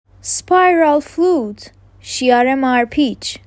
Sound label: spiral flute